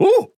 Grunt Attack; Torvald; Paladins

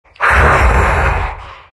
moans; Undying

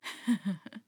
Heh Heh; Skye; Paladins

